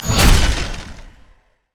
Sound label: teleport; lissandra skin01